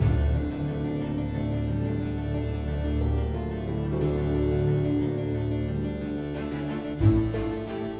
prebattle dramatic; Shadowhand; macos windows gamerip 2017

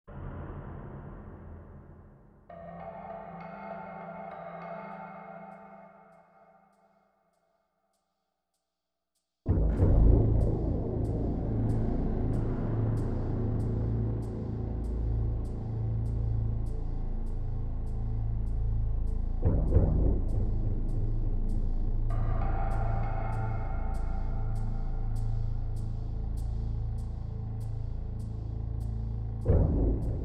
Underground Ambience; Dark Sun Wake of the Ravager; ms dos

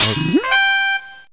KILL OFF; Claw

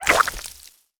SFX Fight Attack Spittle; mighty party 2017 gamerip pc ios android

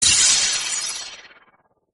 death strike sound; Arc of Templar

